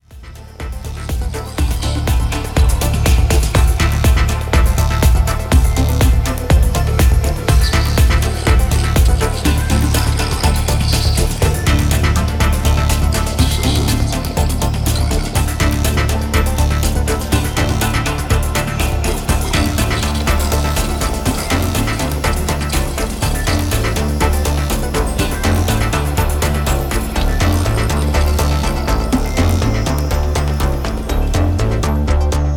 cinematic dance

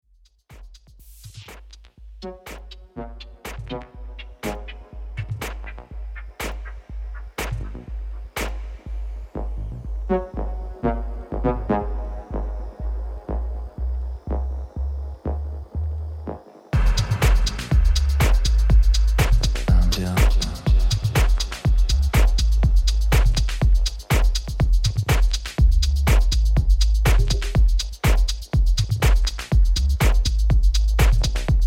rumble

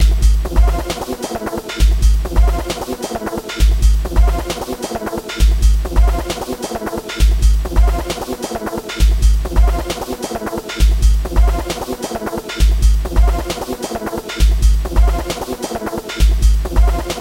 locked groove

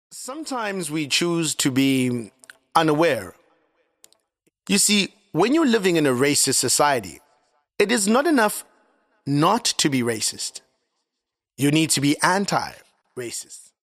dub

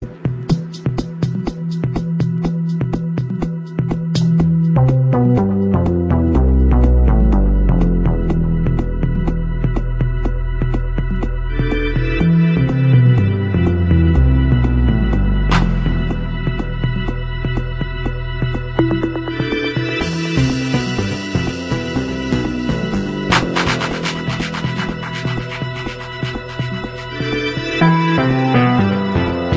synth tool

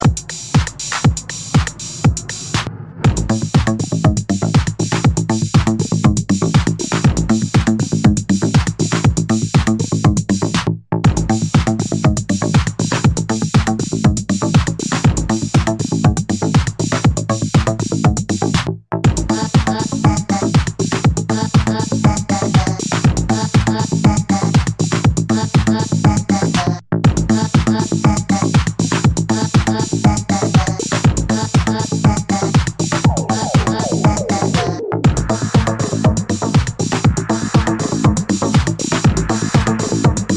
instrumental mix

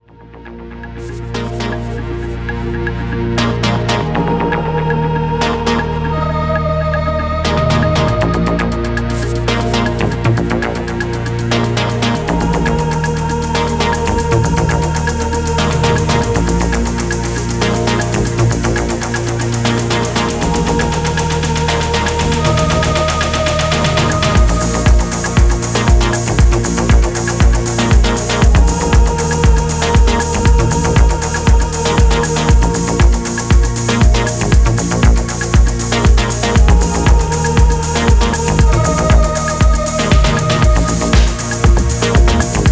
groove

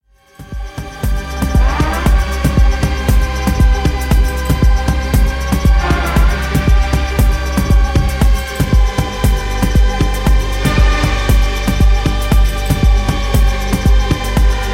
electrical rhythms

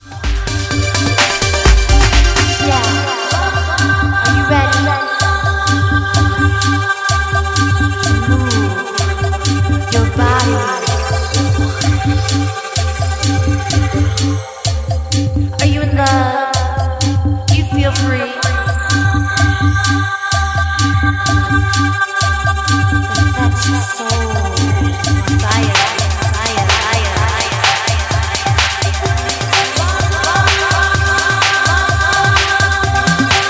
dub

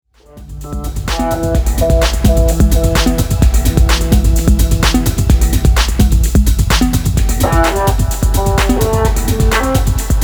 basic drops

